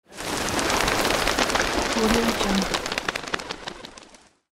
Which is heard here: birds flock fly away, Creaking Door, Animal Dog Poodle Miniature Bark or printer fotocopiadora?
birds flock fly away